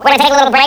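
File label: LITTLE BREAK; Big Beat Samples & More; AKAI; SPEAKS KIT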